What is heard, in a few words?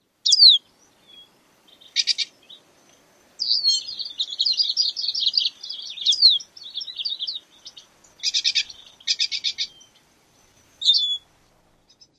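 calls and song, bird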